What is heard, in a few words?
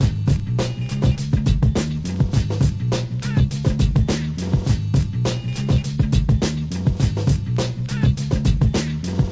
103 bpm funk; yo brown